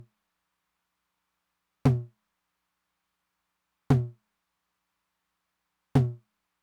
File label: Low Tom, Roland TR-909; Roland TR-909 Ableton Project